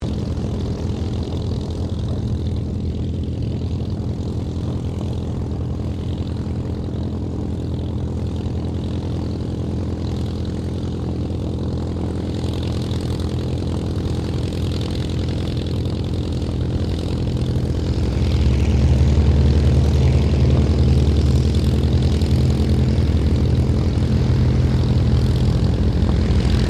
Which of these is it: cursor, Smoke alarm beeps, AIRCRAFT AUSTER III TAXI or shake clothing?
AIRCRAFT AUSTER III TAXI